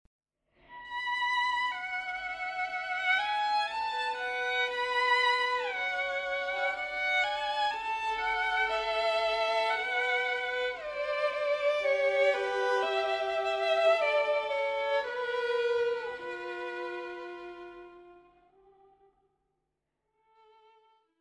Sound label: Prelude And Canon